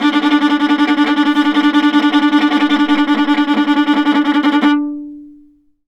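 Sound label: viola tremolo D3; Orchestral; DirectWave; Varazdin Orchestral; Viola II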